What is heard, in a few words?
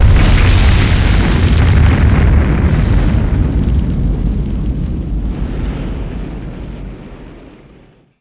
BLAST; ImpGal2; groundwar